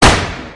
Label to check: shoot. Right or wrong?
Right